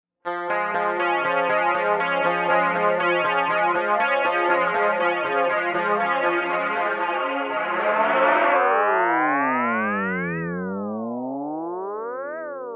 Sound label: Clean Guitar Choral; Native Instruments